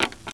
Switch

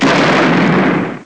shot

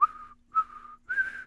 whistling; The Sum of All Fears; English Voice

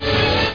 Default Beep; Titanic v1.5

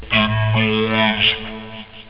noise, speech; OctaMED SoundStudio V1

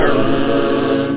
aaahhh, scratch; OctaMED SoundStudio V1

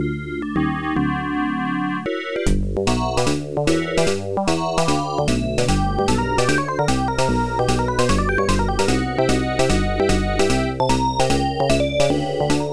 hard blue

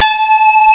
lead

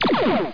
torpedo; escveloc

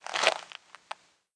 croc eat; Immortal

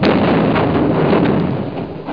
Depth Charge; CManual-3.0

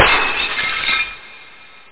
Glass Cra; CLIMB

